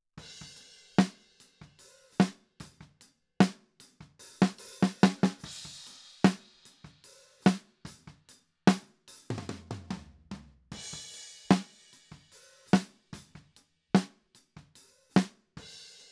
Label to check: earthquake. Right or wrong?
Wrong